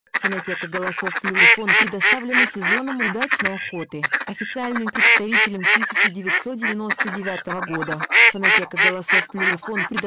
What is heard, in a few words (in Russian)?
кряква; plurifon